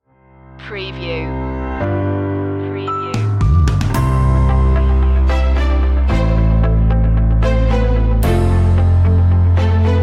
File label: happy go lucky stinger